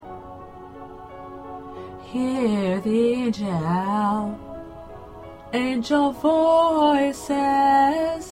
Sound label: Background Tenor, choir parts; part preview